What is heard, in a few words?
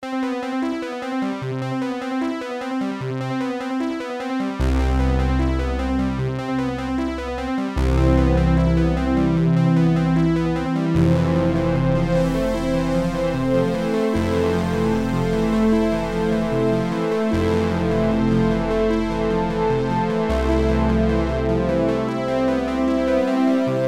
MS20 Bass